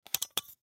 Camera Remove Lens; Digital Olympus Camedia C720; Technology; Cameras; Electronics; Blastwave

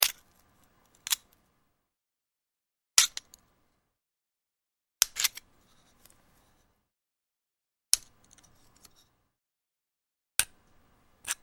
Foley Rock Pick-Scrape Archaeology Small Light; Rocks; Materials

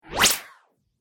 Ultra Fast Swipe Whoosh Pulse

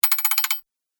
Metal Tapping

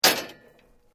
metal rods hit; Metal (Sound Effects:Materials)

